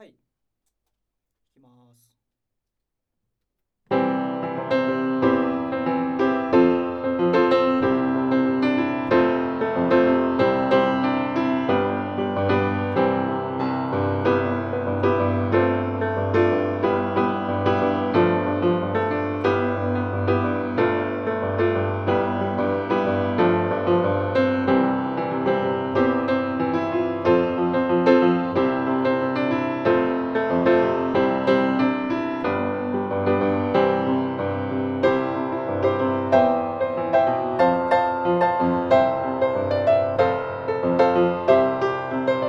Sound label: Piano Hammer On